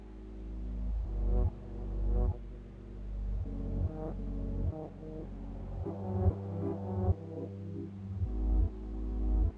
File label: Mondrian Jazz Loop; Specialphoto